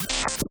Sci misc Reload Alien Tech

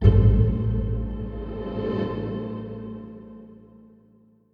Restaurant Modular Strings; The Detail ios linux macos windows gamerip 2014

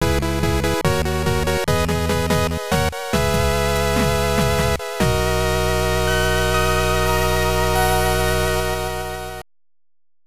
Fanfare; Ganbare Golf Boys; TurboGrafx-16

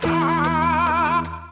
fly; lego my style preschool

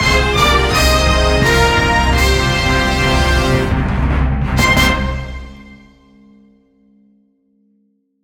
stagecleared; nickelodeon all star brawl 2; ps4; xbox series xs

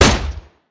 smg fire

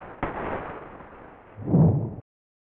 Continue Torch; Pitfall The Mayan Adventure; SNES

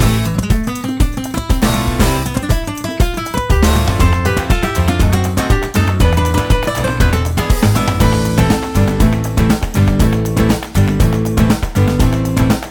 BGM ramen

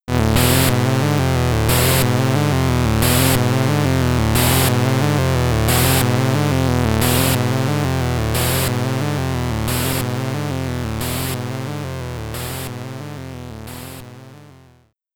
Hydra Theme (SID Stereo); Forbidden Forest; Commodore 64

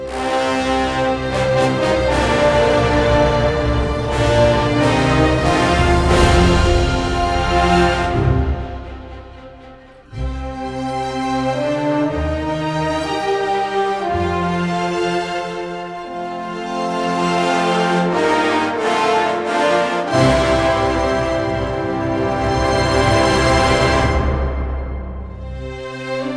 Victory Music Loop; StormKing

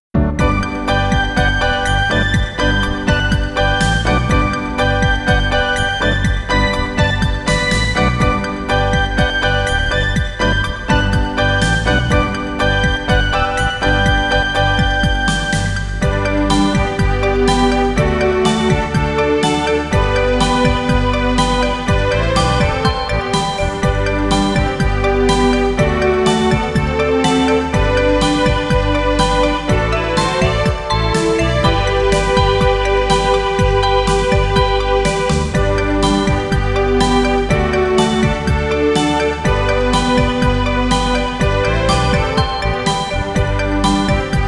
Instrumental; Yuka

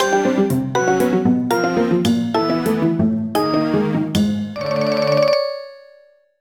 Boss Lose; mario vs. donkey kong mini land mayhem; Teatime Twirl

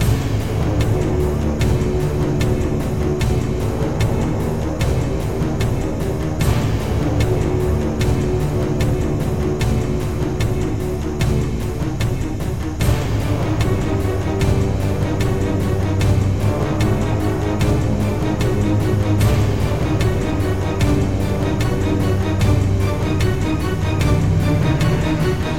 Creature Fight Main; Black & White 2

